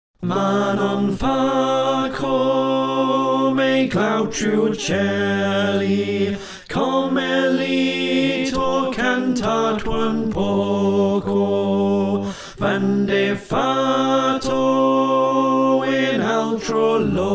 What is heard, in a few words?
Slow Tenor